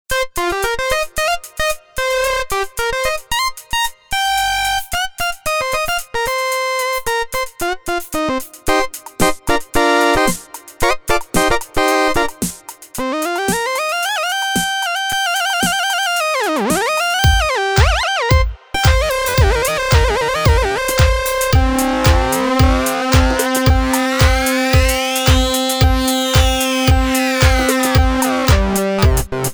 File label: Disco Lead; montage; Kool FM